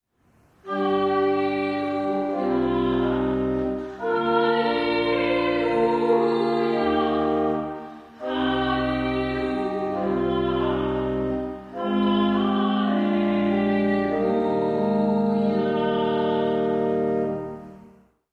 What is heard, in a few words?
Halleluja